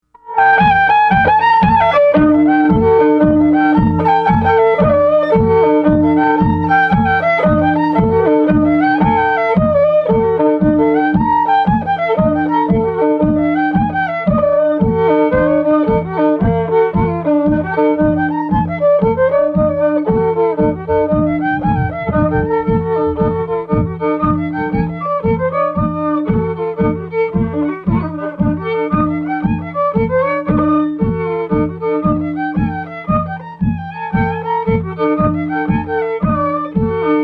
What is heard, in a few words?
D Jig